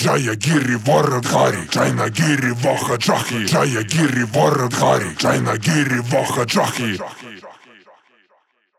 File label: 138 Shamanic Voice (Wet), Psytrance